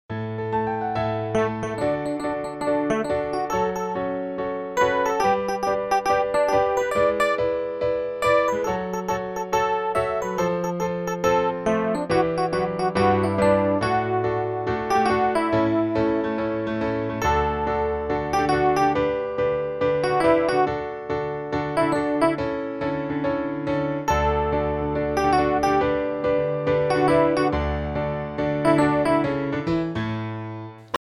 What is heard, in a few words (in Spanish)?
REFE PIANO; tono 3; audición; piano solo; convocatoria a audiciones el mago de oz